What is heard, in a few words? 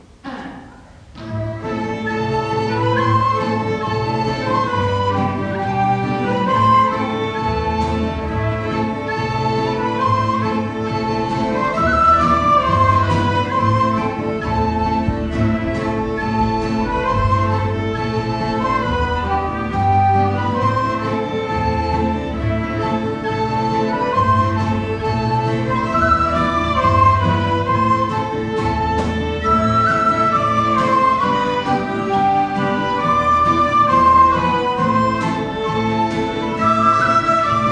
Ievan Polka